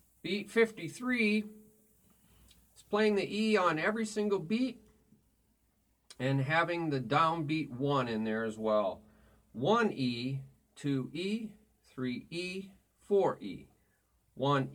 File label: Beat Toom Ba Cha Ba Ti Ba Cha Ba